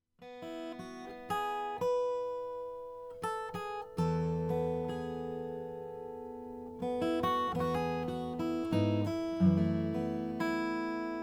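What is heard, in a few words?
mcpherson custom madagascar rosewood; mg4 0xp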